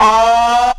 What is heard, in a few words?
aw; ooh ahh